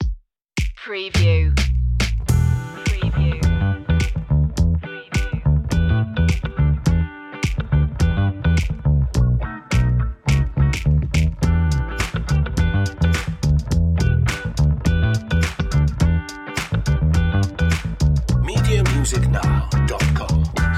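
curious cat underscore, A playful and funny bluesy track, with bouncy bass, quirky; funky groovy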